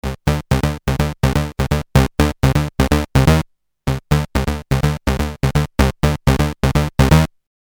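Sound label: polivoks sequence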